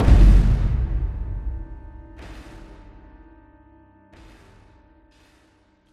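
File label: sting drum hit fade; IACON RUINS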